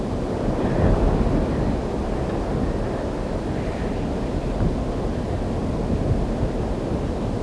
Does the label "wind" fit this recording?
Yes